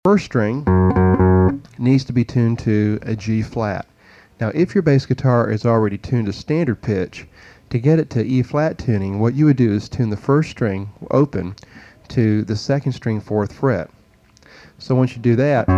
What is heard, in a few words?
Eb Tuning; Lesson